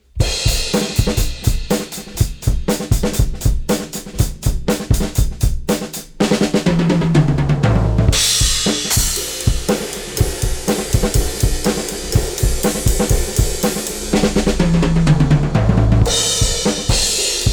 drums; MIXROOM

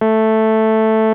Nord Lead 4 sine FM

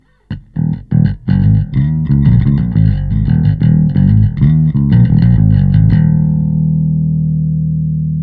bass; Joe Chiccarelli

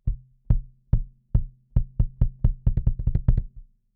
kick

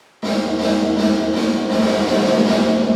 Plate Reverb

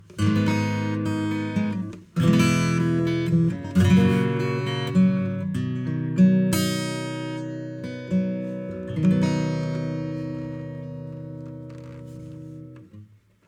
FILTER Notch 2 pole CUTOFF 770Hz; Valves; REONANCE 60

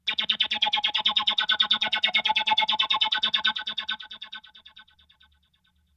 Nord Lead 4 arpeggiator preset

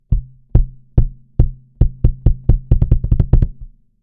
Kick; smart EQ 3

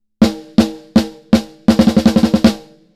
Punchy Snare; Spectre

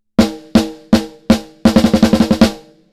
snare bypass; AMEK 9099

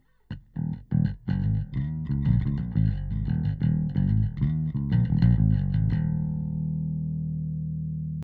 bass Low End Power; SplitEQ

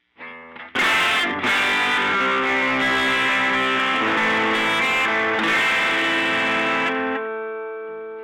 tube delay wet

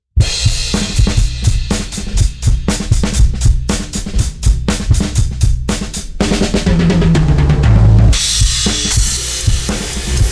Kick Punch; RootOne

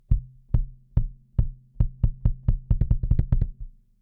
kick; SSL X Limit

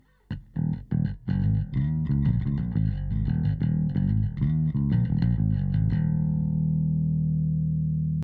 Compressor bass Bass Attack

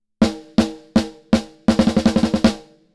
snare bypass; Royal Compressor

